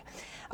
Birds

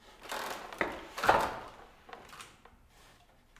Antique Loom In Use Weaving; Electa Quinney

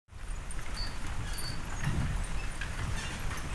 Horse Drawn Wagon By; Electa Quinney

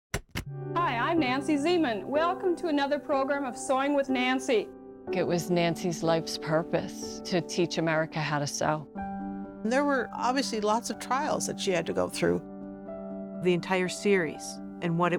clap; Nancy Zieman; Promos

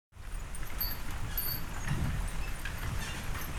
Horse Drawn Wagon By; Electa Quinney

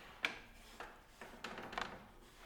Antique Loom In Use Weaving; Electa Quinney

